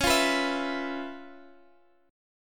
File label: Db 7th Sharp 9th Db, down, Guitar Open F; Db7#9; strummed